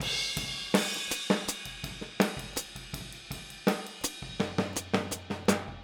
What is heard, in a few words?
Boogaloo Fill Hi-Hat, 82 BPM; Sonic Foundry; Drums from the Big Room The Mixes